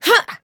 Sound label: Grunt Jump; paladins; Seris